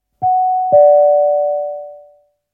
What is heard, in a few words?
sirens; bbc